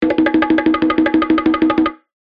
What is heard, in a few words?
cartoon running take off bongos classic; zapsplat